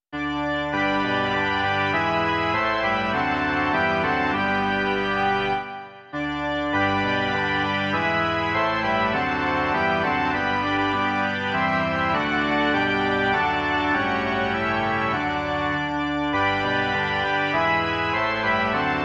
varhany

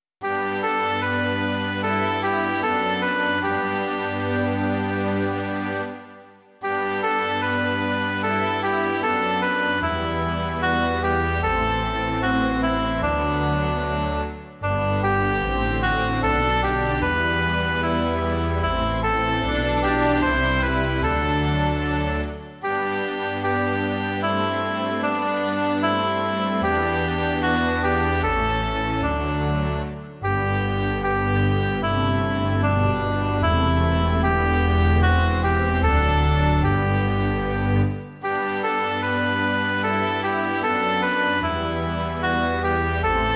hoboj se smyčcovým orchestrem; Jeden Pán, jedna víra; Imaginativní verze

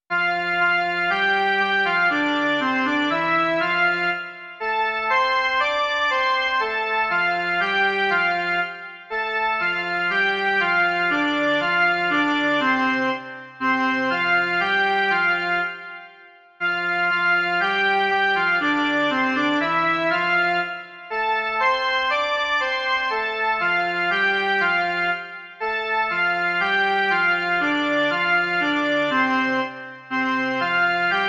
jen melodie; Vstal z mrtvých Kristus Spasitel

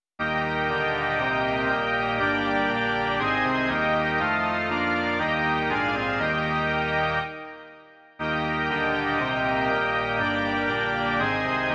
varhany

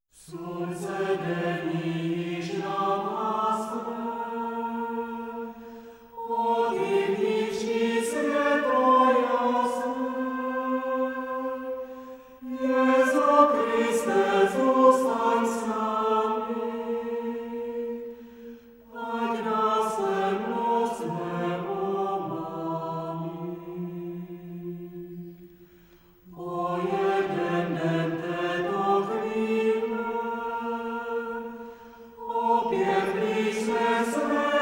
Slunce denní již nám hasne, Zpěvníku; Dodatek Zpěvníku CČSH

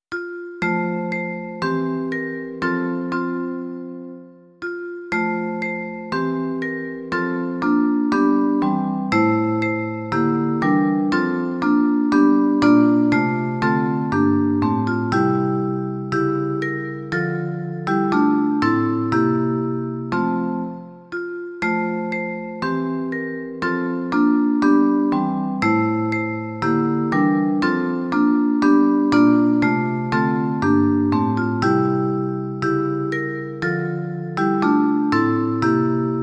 vibrafon a harfa; Kdo spatřil jasný hvězdný třpyt; Imaginativní verze

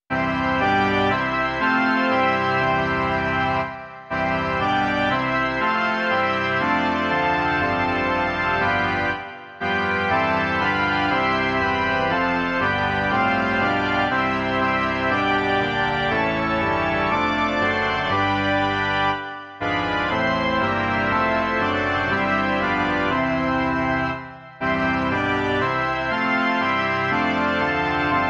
varhany; Když vycházíme z domu tvého

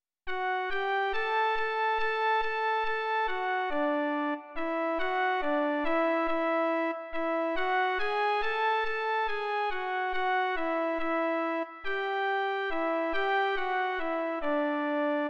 jen melodie, Ženská verze; Doprovody Farského liturgie; Milost Pána Ježíše Krista

